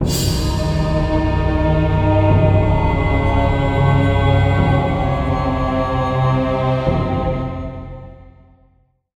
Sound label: Battle Lose; lost sector